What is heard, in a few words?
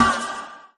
bop, Stabs